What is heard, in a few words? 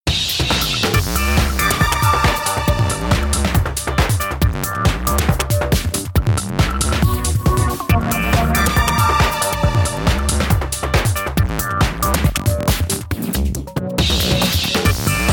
typing; tf2ware ultimate